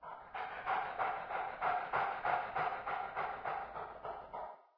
dog pant behind wall, animal; ambient; Source Engine; cstrike

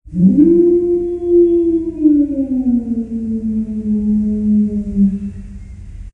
wolf; ambient; Source Engine; tf

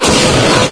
rocket fire; video games; weapons; source engine; portal

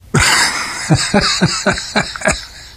laughter

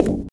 glass, footsteps, survivor, run

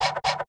laugh short; pyro; source engine; tf; mvm

